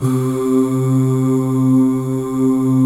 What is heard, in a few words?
C2 MALE OO; Northstar - New Gold VOL-2